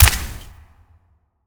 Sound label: Barefoot